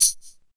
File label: PER TA, Percussion, Tambourine